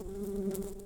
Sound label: Humilis workers, bumblebees